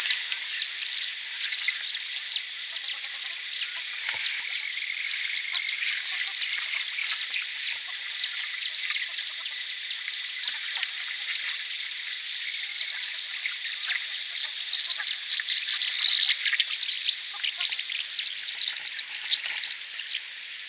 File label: Grey throated Martin Riparia chinensis chinensis, grey throated martin call